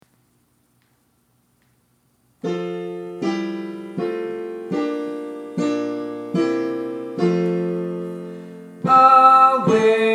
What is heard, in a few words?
Tenor